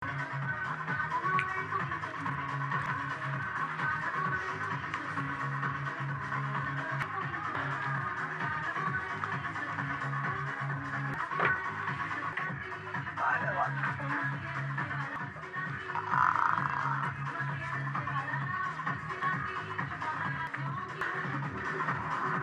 bb dance

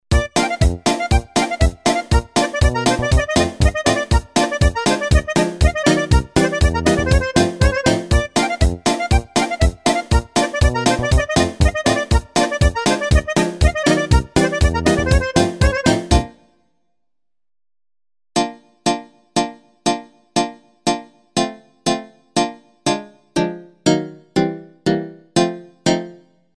Canvas Nylon kontra

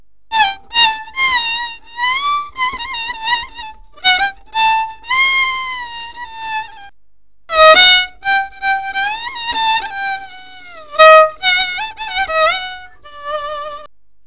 violin

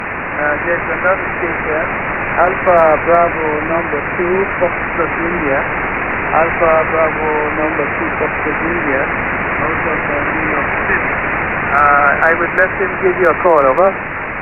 sdr 14.3mhz

